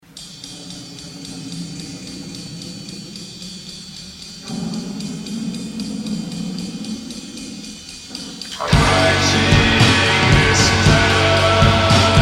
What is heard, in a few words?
Rain Song